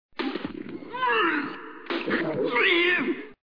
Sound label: PUKE